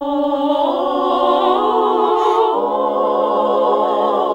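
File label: Classical Sing, choir; Best Service Gigapack 1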